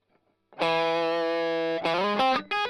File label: Strum Line